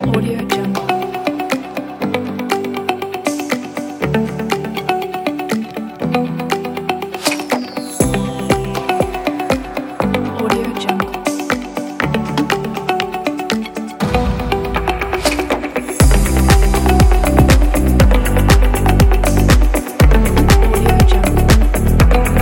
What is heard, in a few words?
winner